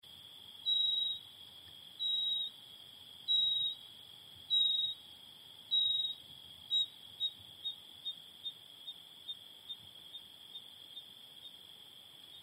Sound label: Nothura minor